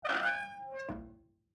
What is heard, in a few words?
Safe Door Open, Office, Large, Metal, Squeak; Metal Doors; BlastWave